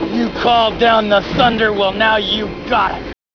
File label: thunder